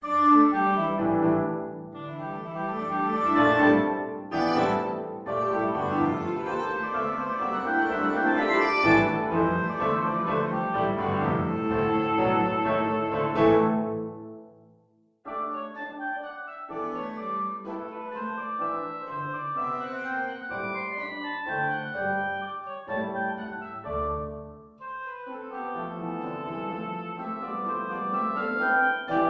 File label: oboe cello piano